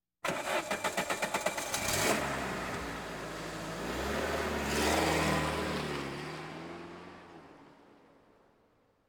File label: Automobiles various